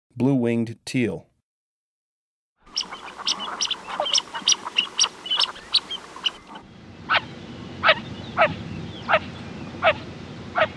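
Blue-winged Teal, Breeding Birds of the Chicago Region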